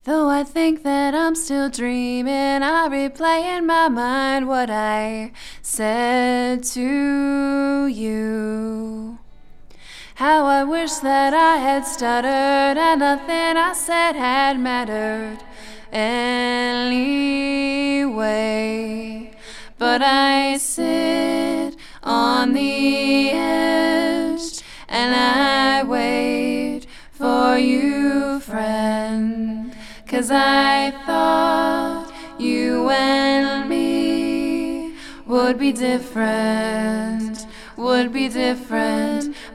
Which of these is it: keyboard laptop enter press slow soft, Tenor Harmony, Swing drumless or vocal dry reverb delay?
vocal dry reverb delay